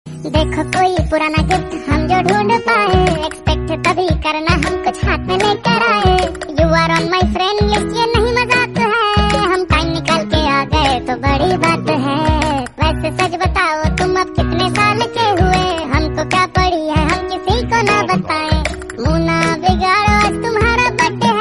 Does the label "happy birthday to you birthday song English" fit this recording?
Yes